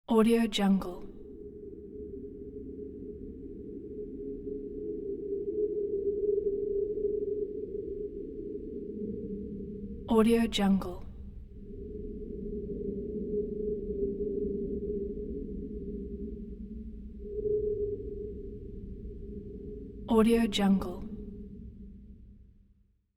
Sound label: synth underwater tonal ambience; hollywoodedge